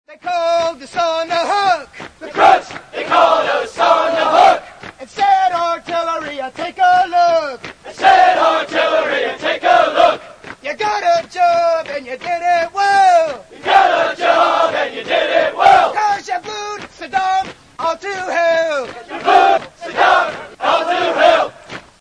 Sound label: u s marine corps running cade